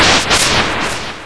Explode; Lab-Rat v2.0

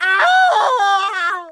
plyrdth; quake; halo; player

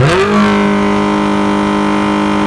throt, Car

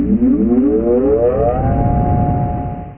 Phaser Charge; Missions⁄Reliant!

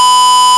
sqr 1000; Analysis & Editing; AcouSynthesis

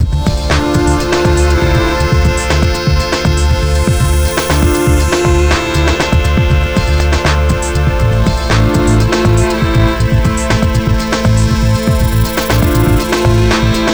violin; GROOVES

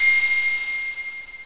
recorder; OctaMED SoundStudio V1; mirage

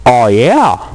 1up; Escape Beyond 2001

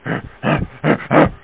gorilla; G-Netix; ISM

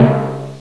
timpani drum, percussion instruments; Sound Effects for Multimedia - Volume 1

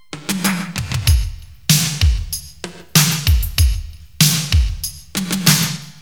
BEAT, stereo 44k, MUSIC

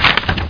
Book Page; Total Distortion

